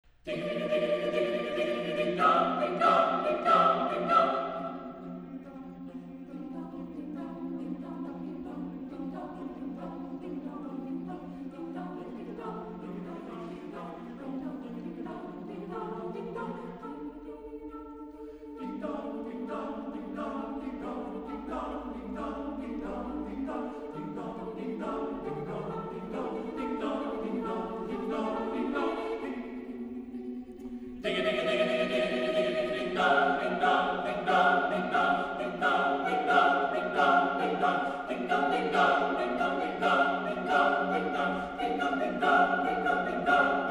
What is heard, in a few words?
Elves Bells